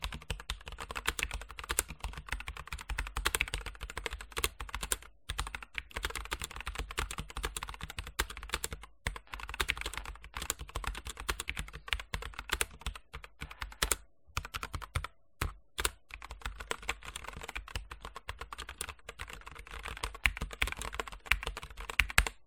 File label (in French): enregistrement son nzxt function